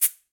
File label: Cabasa Short; Latin Percussion; Drums; Drum Hits; Shaker; Ableton; Factory Packs